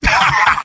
sniper laugh short; vo; tf2; mvm

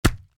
heavy taunt chest hit; vo; taunts; tf2

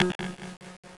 medigun no target, weapons; tf2

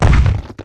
walk; tiny

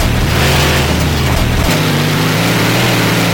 fourth cruise, truck; brrr truck; sentry; manster

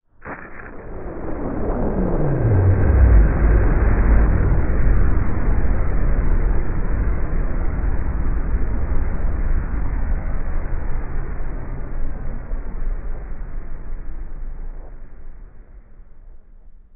citadel outside fx, ambient, city; Garry's Mod

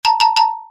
step; xylosaurus

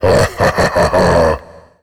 skelly giant; Garry's Mod; tf2; halloween; skeletons

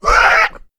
death agony; the monster plane; kolmods

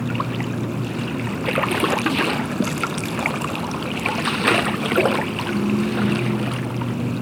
underground water lp, ambient; Garry's Mod; tf2

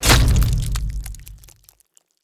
knight axe hit, halloween boss

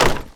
item default drop

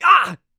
shove; Garry's Mod; tf2

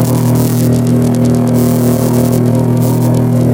atk start; skbt astro duchess

